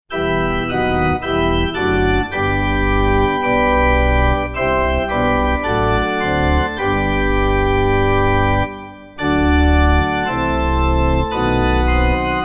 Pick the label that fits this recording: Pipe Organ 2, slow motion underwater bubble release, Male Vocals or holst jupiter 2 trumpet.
Pipe Organ 2